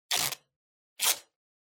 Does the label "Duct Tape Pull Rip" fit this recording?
Yes